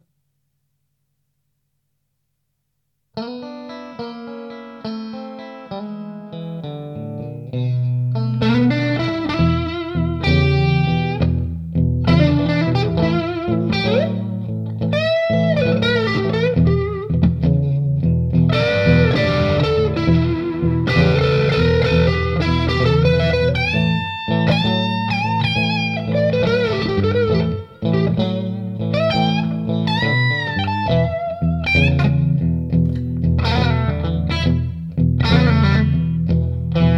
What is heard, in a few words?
vintageplus MIX, guitare